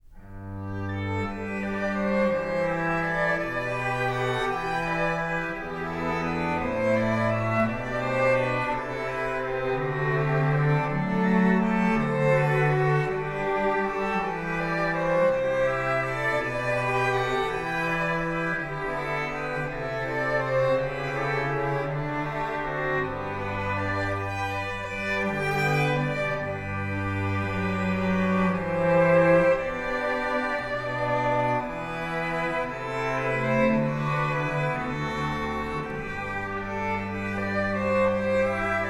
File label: Strings; R-09HR; EDI R09HR